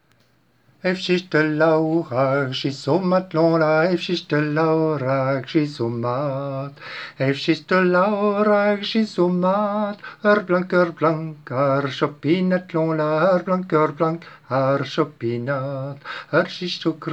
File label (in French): Son ar sistr chant